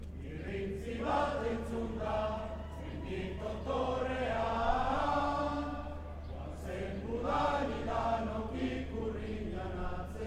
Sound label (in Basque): Donostianoise manifestazioa